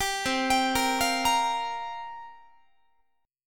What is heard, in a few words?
C 7th Suspended 4th, Guitar, chord; C7sus4; arpeggiated; 22,20,22,20,21,21; 4A,4E,5A,5D,5G#,6C#